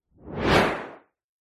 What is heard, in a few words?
sound design whoosh fast high pitched